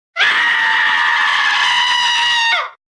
High Pitch Guy Scream

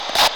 End Radio Transmission

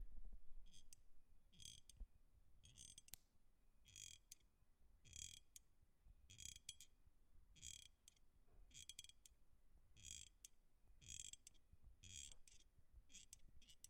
Squeaky Sound

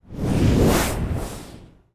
Whoosh

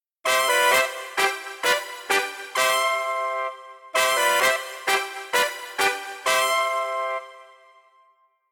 Funny Fanfares

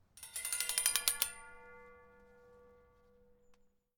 Iron Bar Fence Sliding

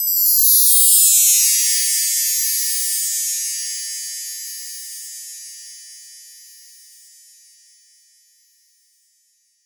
Chimes

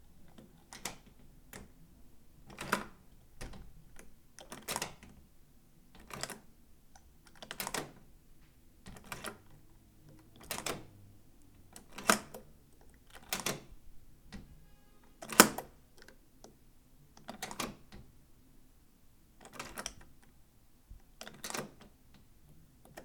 Turning Door Lock

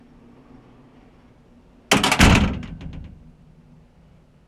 Slam Heavy Metal Door

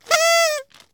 Carnival Whistle

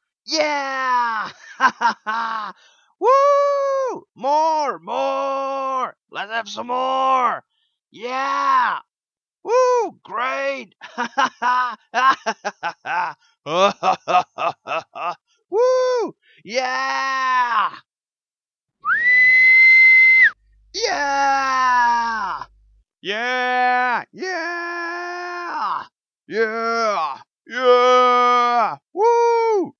Cheering And Whistle

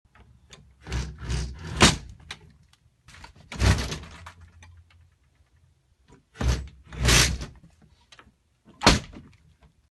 Opening Closing Very Old Broken Door

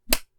A Whip

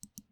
Mouse Click

